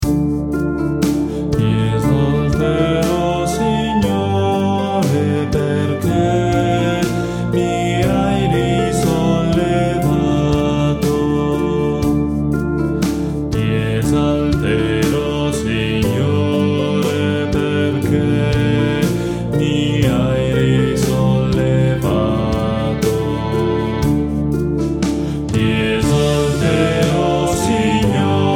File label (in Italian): Ti esalterò Signore perché mi hai risollevato